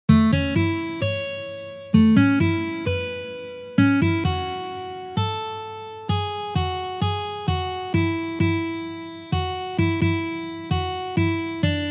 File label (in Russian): na gitare